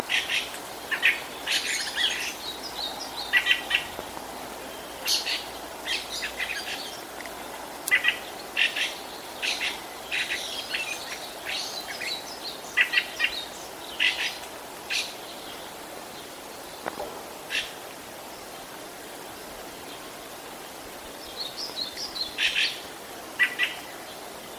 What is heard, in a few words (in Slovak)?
Drozd čvíkota